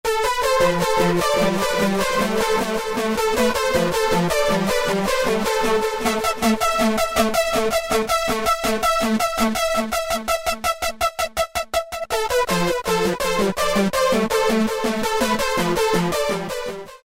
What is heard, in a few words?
Euphoric Synth, Korg microKORG; Factory Voices